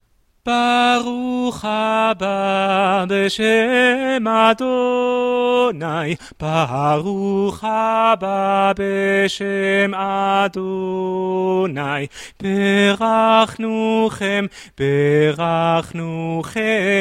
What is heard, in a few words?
alto, choir music; BCC choir